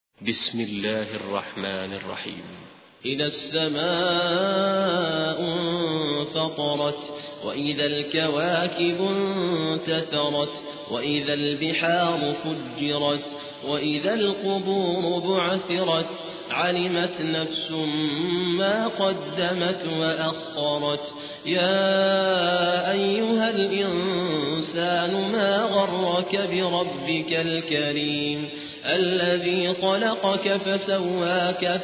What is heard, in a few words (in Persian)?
ترتیل قاری سودانی; سوره انفطار